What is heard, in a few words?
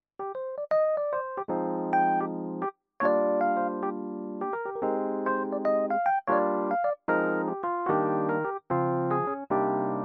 Rhodes; Artis SE